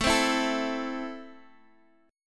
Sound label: C 6th A, chord